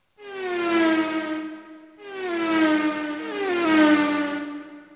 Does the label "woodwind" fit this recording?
No